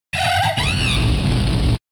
Grito; Infernape

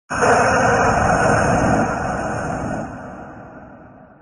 Grito de Venusaur Gigamax

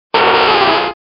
Grito de Nidoqueen; Pokémon

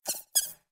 Grito, Pokémon; Grookey

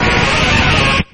Grito de Cloyster

